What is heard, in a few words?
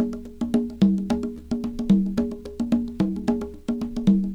con; Percussion